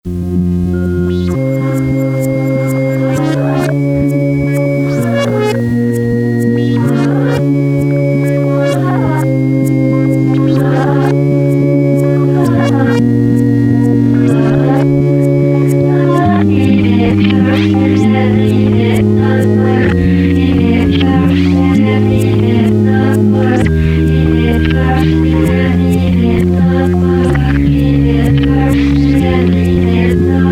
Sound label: Lets Rock Reverse